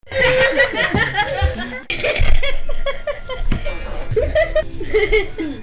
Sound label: laughs